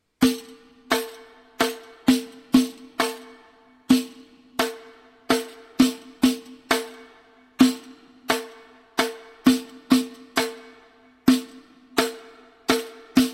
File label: riq